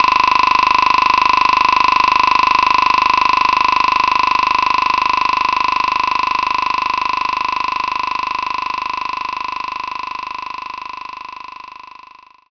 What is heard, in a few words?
woodblock